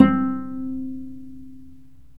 STR VC PIZ, Chamber Pizz; Roland - String Master Series

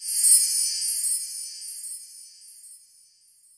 W Chim, Percussion

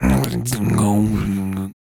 VOICE FX, Dance Vocals; Best Service ProSamples vol.12; AKAI

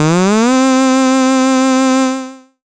syn c, Dance Synths, Acid Bubble

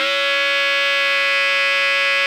ALTO GR C#4, Saxophones; Greytsounds; Philip Wolfe David Crozier's Saxophones

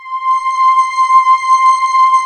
JV-1080 WARM SHIM

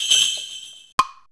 SLEIGHBELL; DRUMS & PERC

